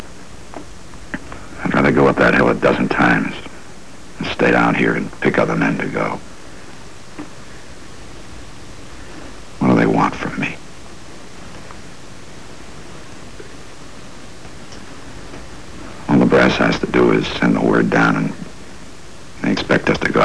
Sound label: brass